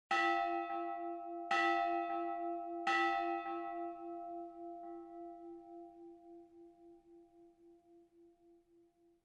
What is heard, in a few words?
Triple Bell